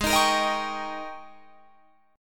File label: G sharp Major 7th Suspended 2nd Suspended 4th Guitar Drop D